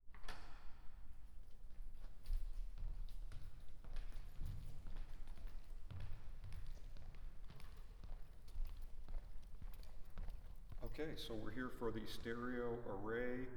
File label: binaural R; chamber96